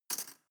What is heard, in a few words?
cutlery teaspoon drawer place